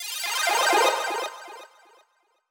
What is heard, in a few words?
Extra Life Collect